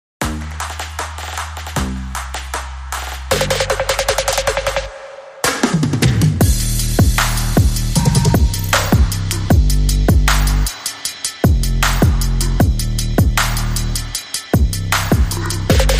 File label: Drums and Bass